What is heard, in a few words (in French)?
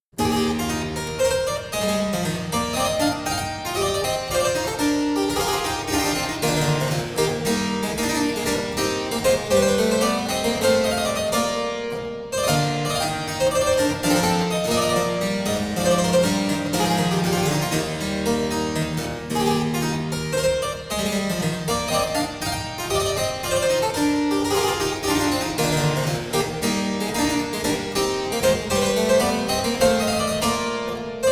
Suite En Fa Mineur Gigue